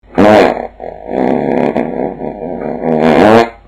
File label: fart